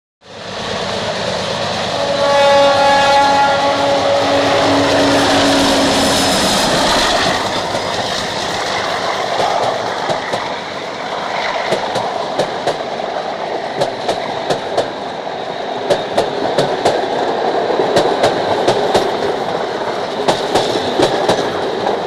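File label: By Diesel Train Klaxon Horn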